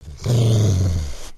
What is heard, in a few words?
Pet Doberman Growl; creatures pets